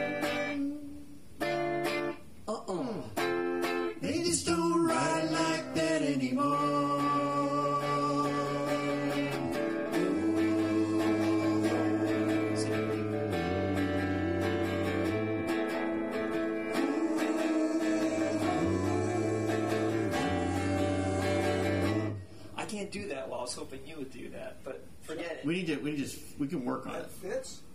woeful harmonies

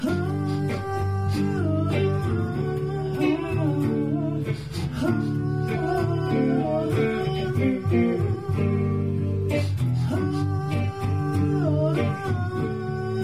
Harmonizing

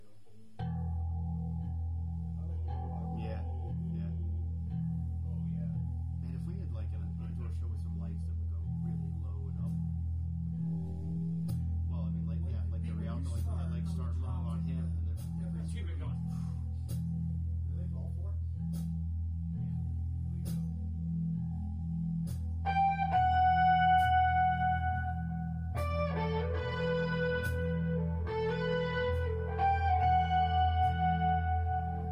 alt slow start, Jams

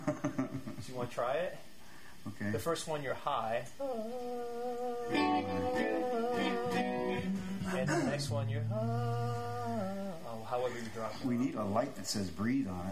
intro harmonies practice

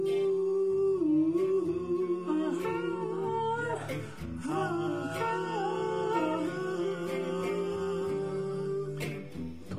OOO Ahh

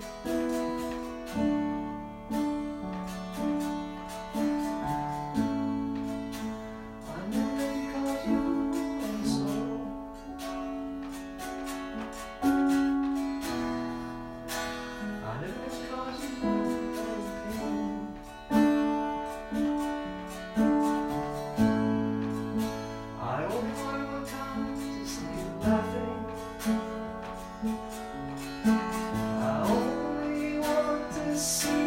Unplugged On Piano

